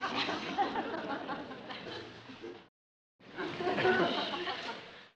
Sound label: Crowd Lt Burst, small int